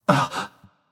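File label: nonverbal surprised; smite; voicepack; merlin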